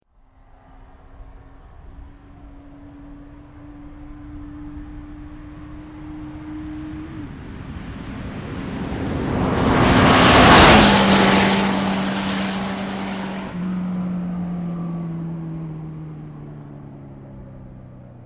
Motorbike Honda 1100 Pass very fast

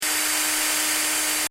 Drill, Hand Drill, Running; Tools; Drill Sound Effects; Drills (Sound Effects:Tools); Stuart Duffield

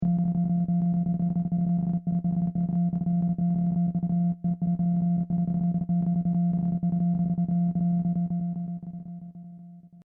SciFi Scanner; Communication; Perfect for general Science Fiction usage or Multimedia usage; CraftedSignals

